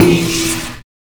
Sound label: Stab Show; FREE SFX PACK VOL 2